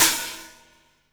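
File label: crash, Drums Acoustic; USB Soundscan vol.10; AKAI; 90s sample CD; DYNAKIT 1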